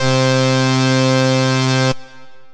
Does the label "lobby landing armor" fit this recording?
No